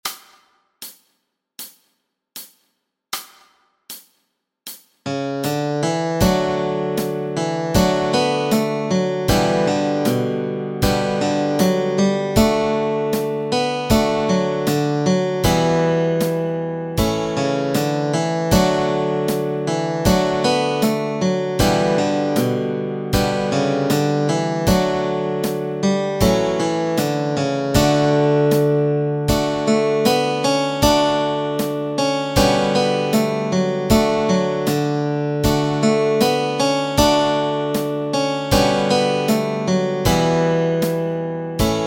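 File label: Melody and Chords Track, Easy Guitar and Ukulele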